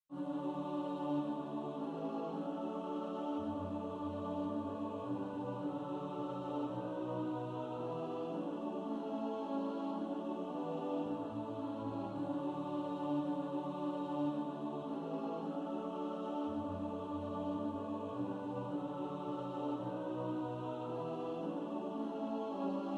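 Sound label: alto, practice music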